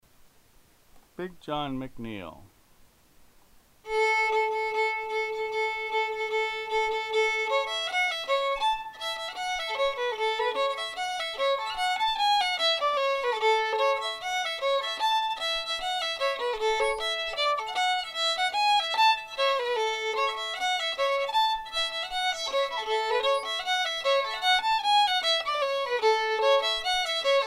fiddle; Big John McNeal; festivaltunes; fiddle set 1

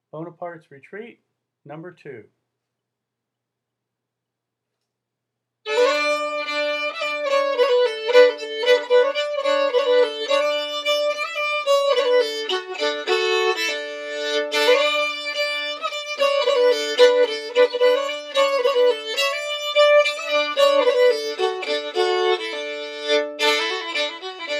fiddle; festival tunes

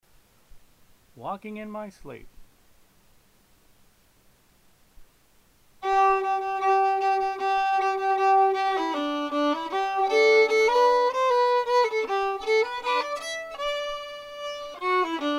fiddle; festival tunes

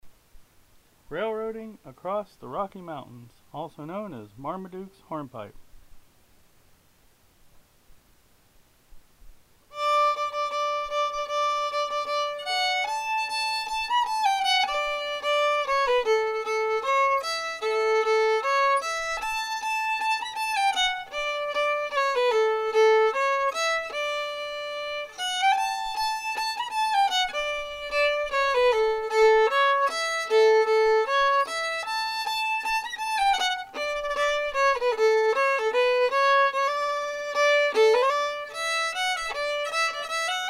Hornpipe, fiddle; festival tunes

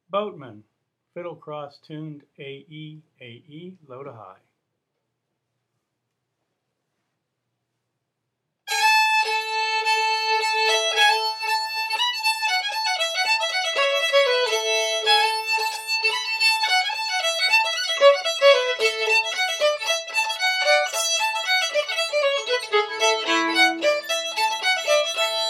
fiddle; festival tunes

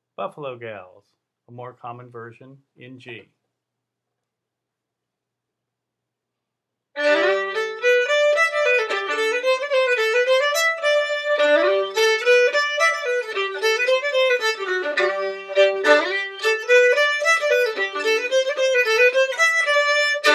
in G, fiddle; festival tunes

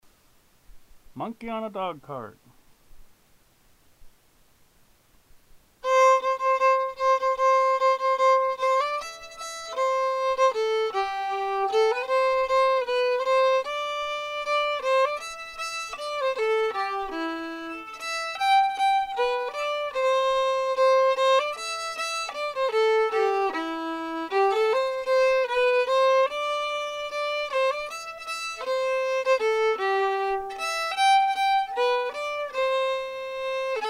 fiddle; festival tunes

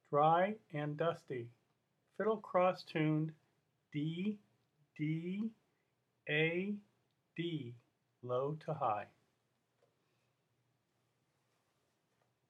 fiddle; festival tunes

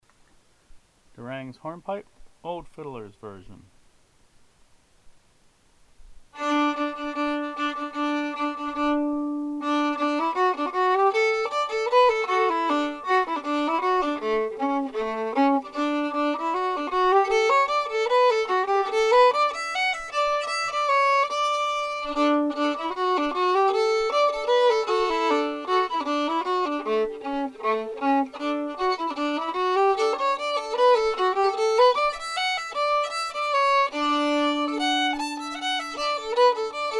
fiddle; festival tunes

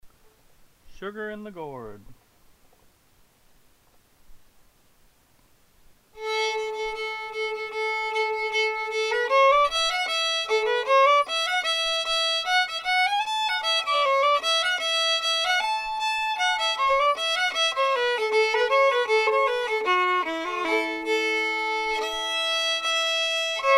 fiddle; festival tunes

